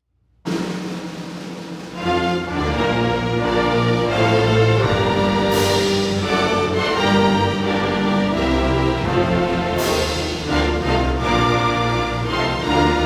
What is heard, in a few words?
Orchestra Only; All-State Orchestra Recording; National Anthem; MSHSAA MMEA